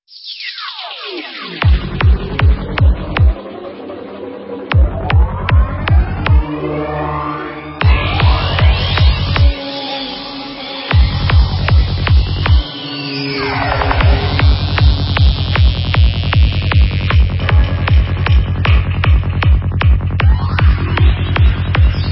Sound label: noise gust; Label: Rajas Records; Style: Goa Trance